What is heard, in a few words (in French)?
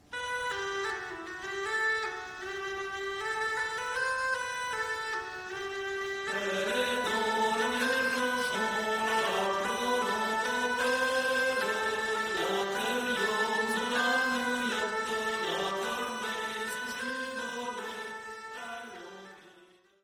Branle des Vieux, France